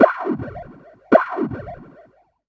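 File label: RAZE snare drum, drum loop; Big Fish Audio; Uncivilised G'rooves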